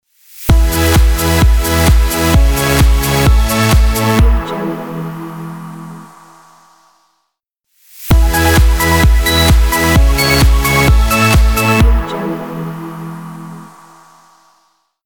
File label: positive dance logo